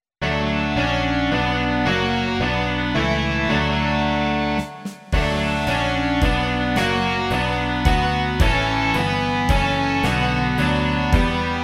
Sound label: rock